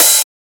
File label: Open Hat; FLPRO